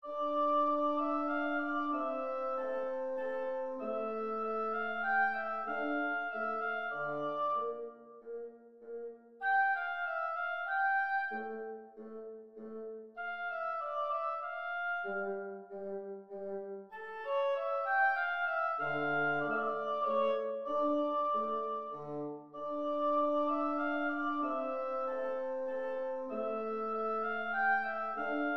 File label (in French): menuet en re mineur